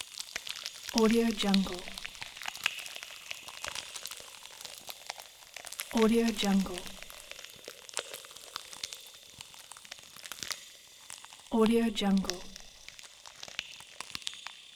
cooking popping; domestic sounds

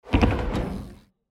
bicycle jumping off ramp kicker; urban sounds

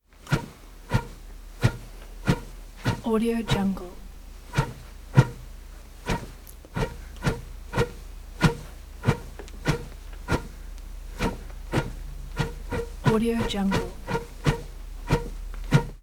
designed whoosh pipe whoosh; transitions and movement

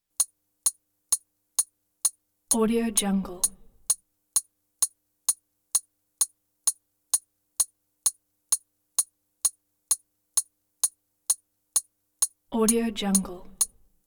small metal hits; ضربه ریتمیک به فلز; industrial sounds

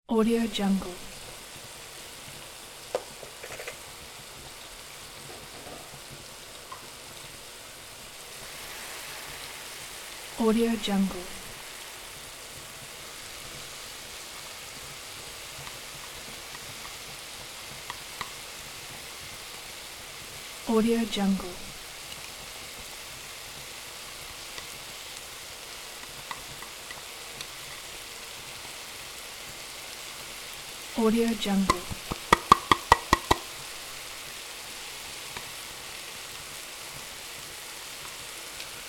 cooking and frying dinner; domestic sounds

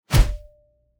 hammer whoosh; transitions and movement

